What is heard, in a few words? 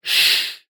shush